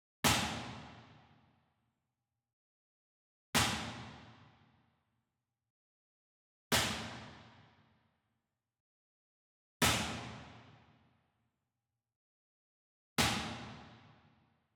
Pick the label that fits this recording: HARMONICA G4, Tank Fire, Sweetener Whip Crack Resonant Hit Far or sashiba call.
Sweetener Whip Crack Resonant Hit Far